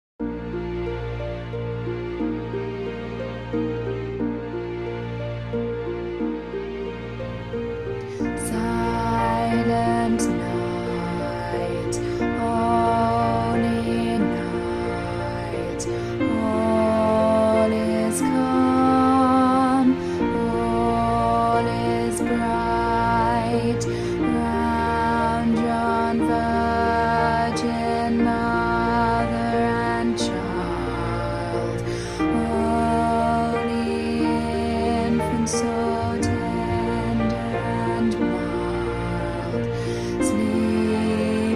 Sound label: Silent Night tenor bottom part, choir